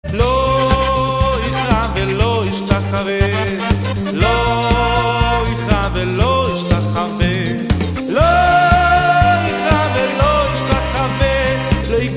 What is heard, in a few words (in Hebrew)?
צילצול